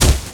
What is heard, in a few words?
impact fall; yo frankie